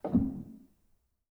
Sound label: rel, Grand; bitKlavier; Binaural